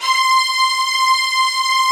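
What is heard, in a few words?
HALL STRING; Greytsounds; Scott Peers Sound Engineering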